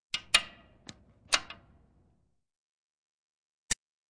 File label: Track, Doors; 헐리웃특수효과음; 문 소리